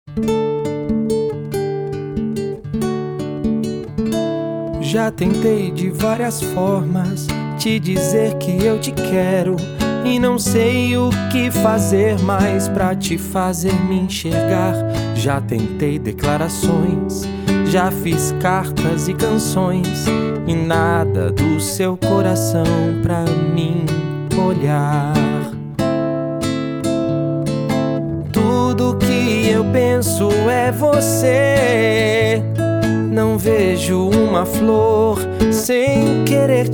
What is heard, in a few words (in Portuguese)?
meu violão e voz